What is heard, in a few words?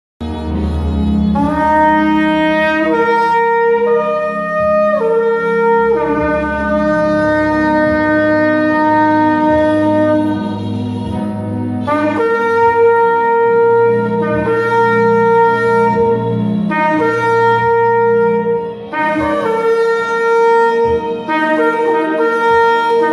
Blowing The Shofar; Unto The Lord